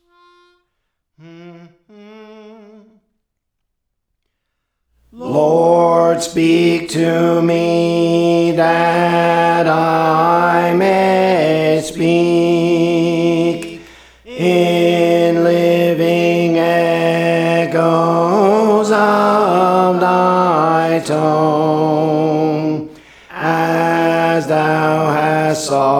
Alto; Praise For The Lord Training; Lord Speak to Me

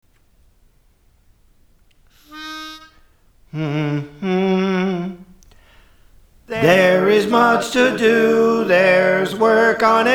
Soprano; There Is Much to Do; Praise For The Lord Training

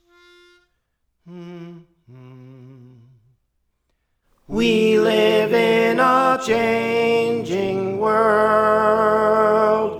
Tenor; Praise For The Lord Training

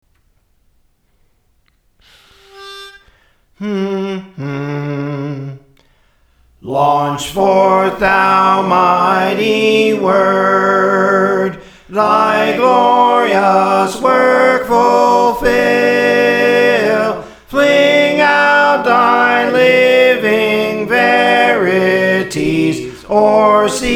Soprano; Praise For The Lord Training

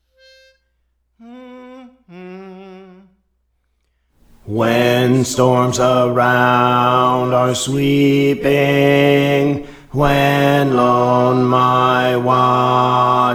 Bass; Praise For The Lord Training

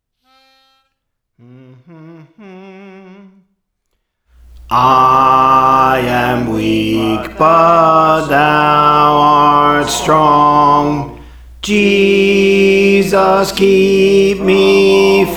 Bass; Praise For The Lord Training; Just a Closer Walk with Thee

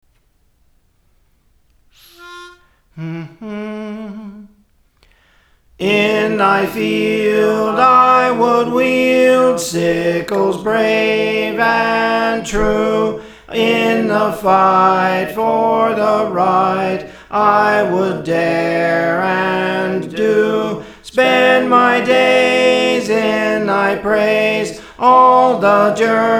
Soprano; Praise For The Lord Training; Let Me Live Close to Thee

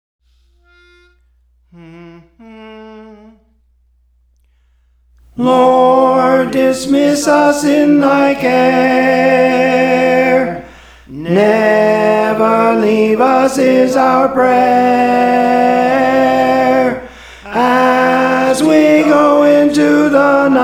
Tenor; Praise For The Lord Training; Lord Dismiss Us in Thy Care

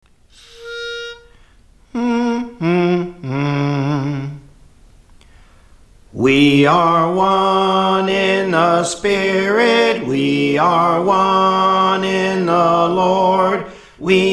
They'll Know We Are Christians, Soprano; Praise For The Lord Training

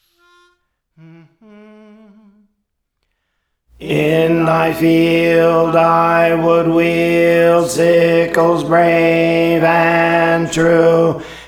Alto; Praise For The Lord Training; Let Me Live Close to Thee

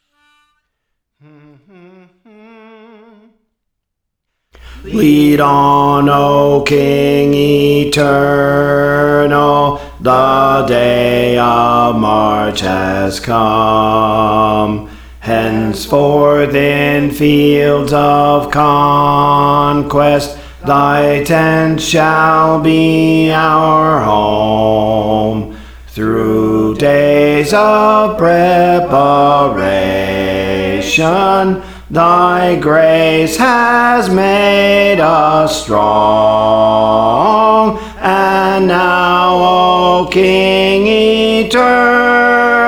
Bass; Praise For The Lord Training; Lead On O King Eternal

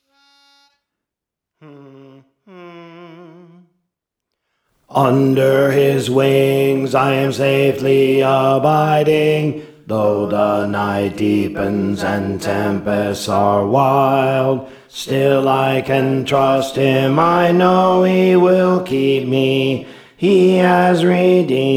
Bass; Under His Wings; Praise For The Lord Training

